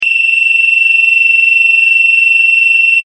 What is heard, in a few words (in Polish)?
ton